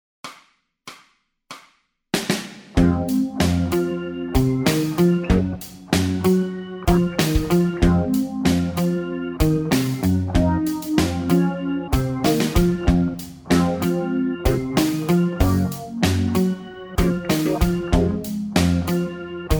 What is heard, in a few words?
bass modes, backing tracks